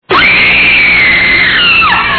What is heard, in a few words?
scream